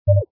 Button Sound

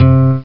picked guitar; OctaMED SoundStudio V1; jazzies samples

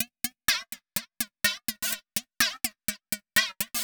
HOUSE 125; eJay

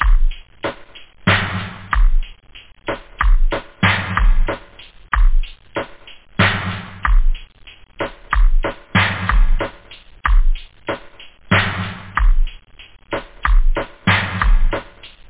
dance, house loop; da capo vol1

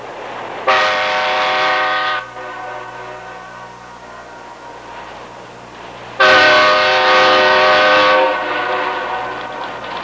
train whistle; Transportation

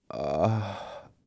groan; Singles Flirt Up Your Life

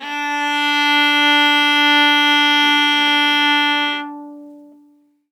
Viola Loud D3; CM Strings

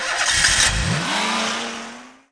car

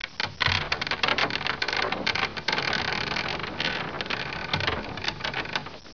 wood creak; environ; Gunlok

